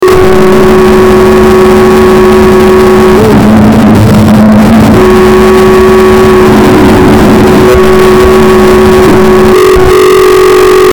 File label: Braincore Recordings; lolicore